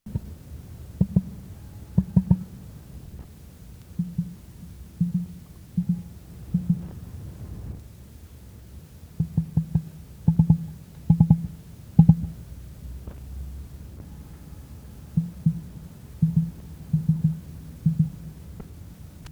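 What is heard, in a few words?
emu, エミュー 鳴き声; 「ブーブー」「シュー」という声を出します。メスはよく響く声を出すことが知られています。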